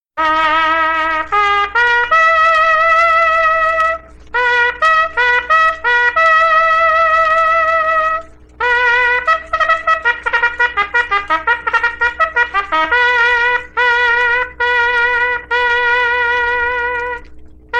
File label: Reveille